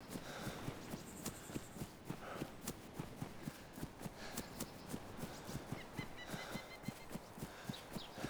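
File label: heavy breathing; DayZ Standalone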